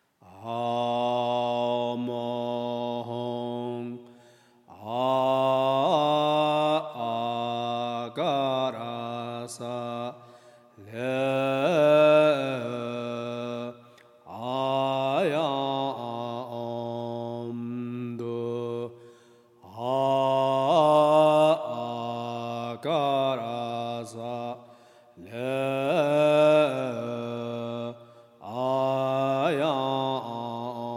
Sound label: mantra dharmakaya